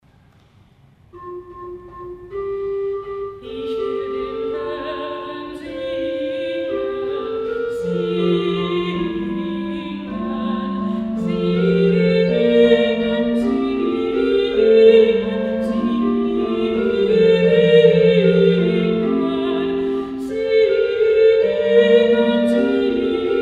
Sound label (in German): Ich will dem Herren singen, Altstimme